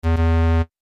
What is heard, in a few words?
wrong; kuizu batu; kyushoku; hatarakuhito